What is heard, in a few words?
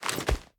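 reload empty end, shotgun; reloads; iw8; romeo870; gmod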